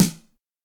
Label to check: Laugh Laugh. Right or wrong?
Wrong